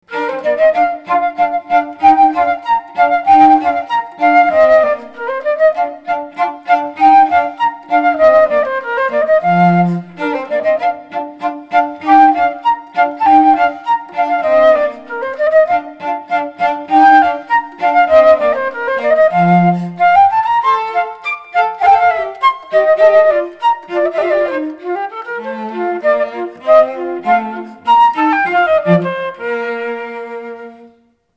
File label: cello flute rustic dance